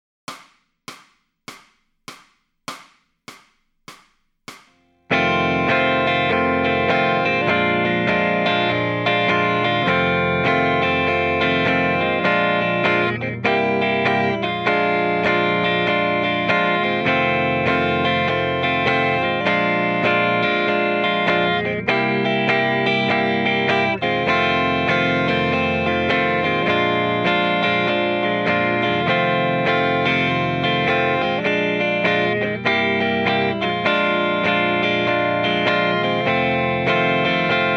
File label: Lead Backing Track, electric guitar